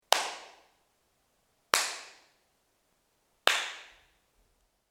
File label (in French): Biere salle musique; Acoustique & architecture